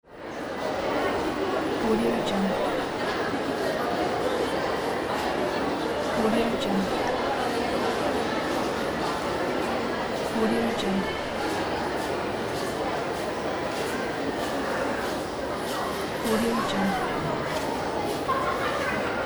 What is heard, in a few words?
shopping center ambience; audio-moment